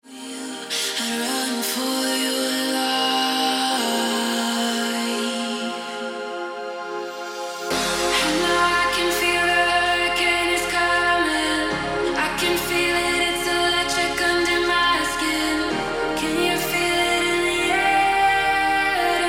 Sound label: Ringtone